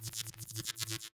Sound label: robot charging; factorio 2.0.55